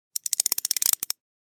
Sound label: Ratchet wrench clicking turning